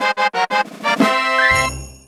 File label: Minigame Fanfare (Good); New Super Mario Bros. Wii